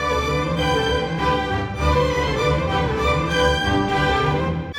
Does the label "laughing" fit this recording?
No